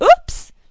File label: fall